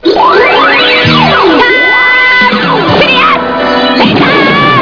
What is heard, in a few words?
laser; Star Serious Laser!; Sailor Moon